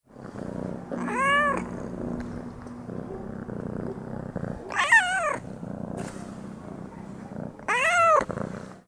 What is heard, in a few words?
kitty